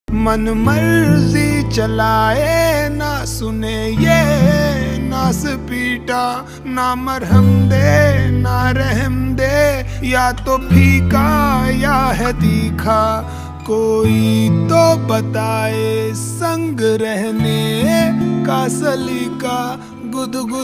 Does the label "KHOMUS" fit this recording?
No